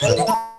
Call Connect; Samsung SGH-ZV50

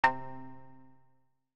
new email, notifications; ZTE Grand-S-2

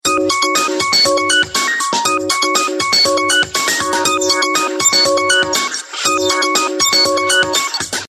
High frequency, phonetones, Samsung; GT-B7620 Giorgio Armani

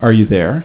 Are You There, Cisco Unified IP Phone 7945G

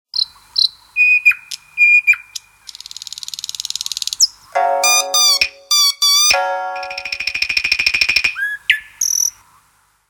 Nightingale, phone tones; Lumia 640 China

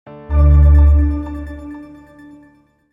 imtone; Jolla

